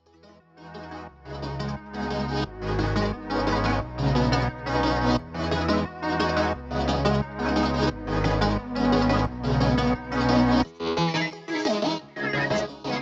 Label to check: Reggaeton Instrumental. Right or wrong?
Right